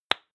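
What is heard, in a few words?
lipstick open hard, Bathroom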